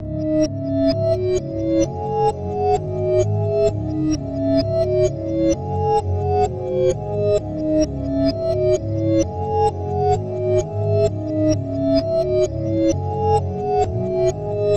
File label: 130 BPM C# Min, reverse hip hop melodies; Hands Down